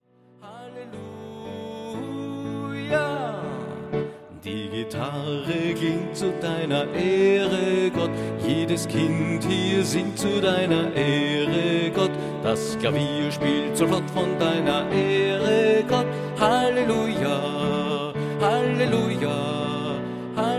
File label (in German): Die Gitarre klingt; Birgit Minichmayr; erstkommunion